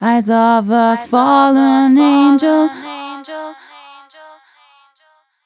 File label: f 140 mel b-flat, fem Vocals